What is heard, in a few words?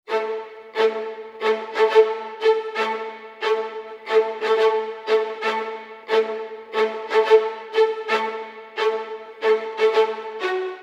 90 f#min violins